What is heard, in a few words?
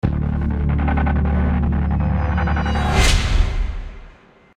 System Alert; Terra Invicta